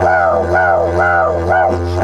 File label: 120, Didgeridoo